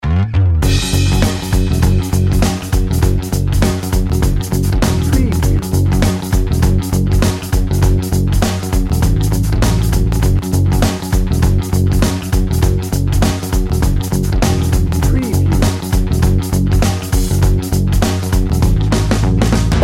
80s chill

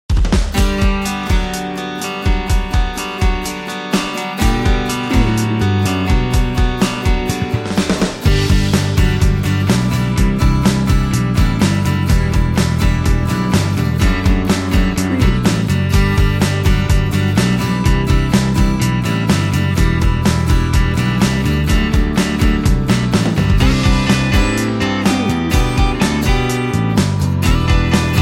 americana soft rock bed

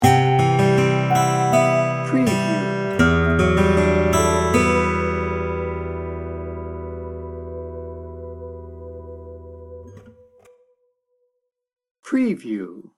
shadows sting bumper

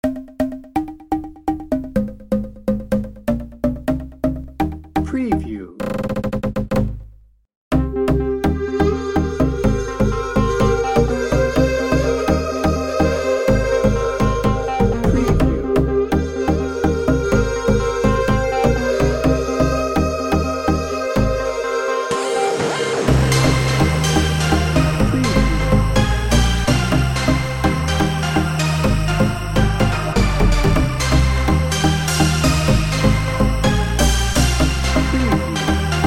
dance party edm no drums

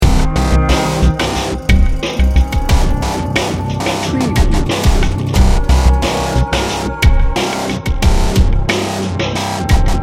disturbing trends loop

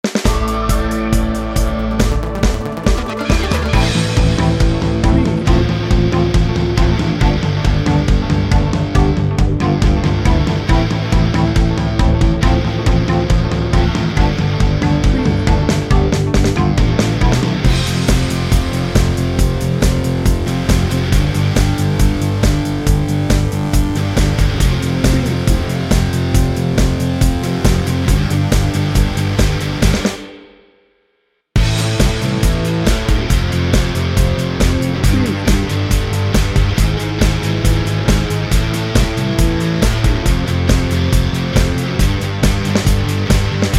struggle and triumph bed

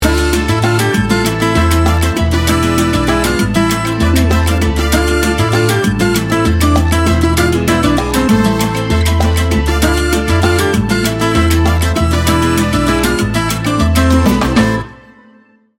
chica dancer 15 second

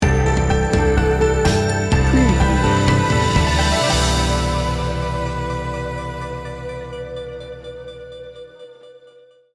dream state sting bumper

